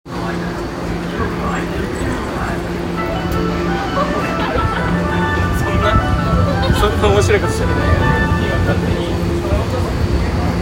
銀座線赤坂見附東, train sounds

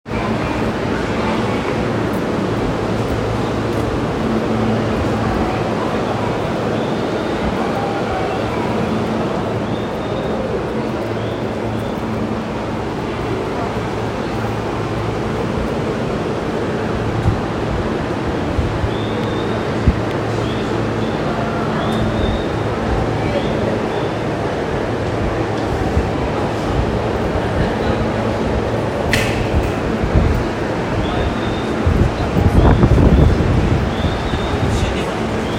線調布 ambience, train sounds; 京王

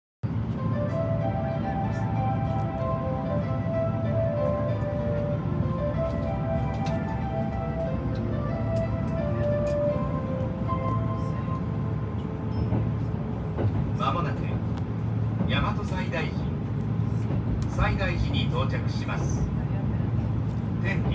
京都線大和西大寺南, train sounds; 近鉄; 近鉄京都線大和西大寺南

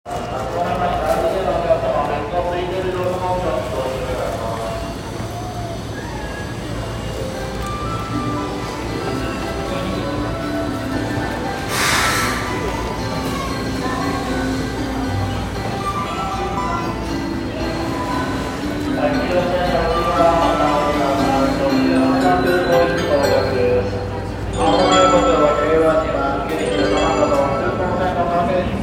京急本線品川南接近

